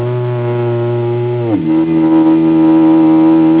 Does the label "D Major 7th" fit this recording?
No